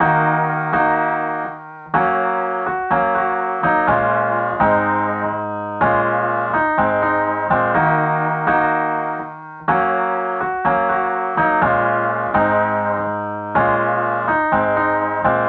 House Pianos 124 BPM Bbm DRY; Ultra House Pianos

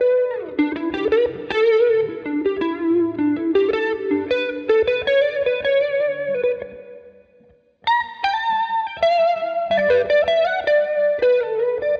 80 E Guitar

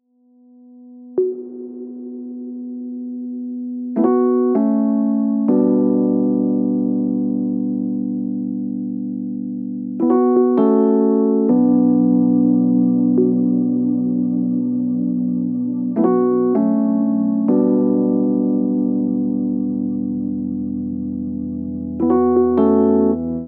80, Keys; Sunset

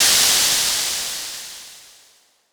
NOISECRASH

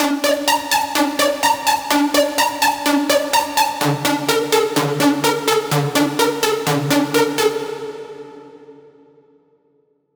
Melody Loop D A A 126BPM; Future Raise

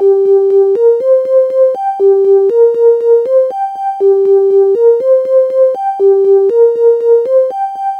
Synth 120 Cm

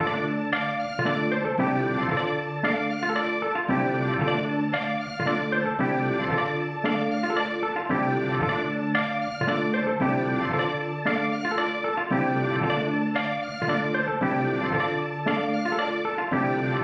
Cartoon Melody Loop D min 114bpm

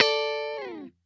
D Chord Fall Guitar

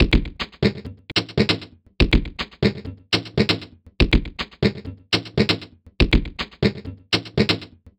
Perc Loop 120BPM Machine Synthetic

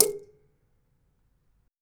PERC TONAL

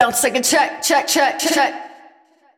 140 vocal bounce like a check wet Dmaj

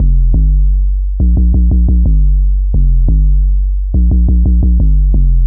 175 D HEAVY 808 LOOP

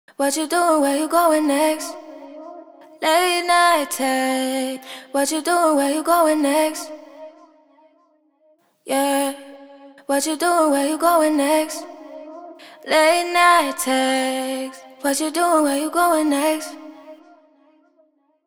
97 vocal loop A m

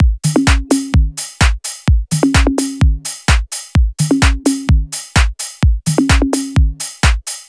FULL DRUMS 128

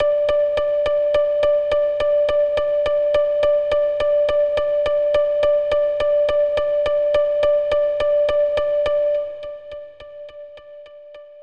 chill 105bpm Dmaj

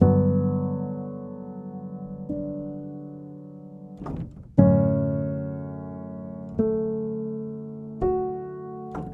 105 loop duo keys acoustic piano chords Dmaj

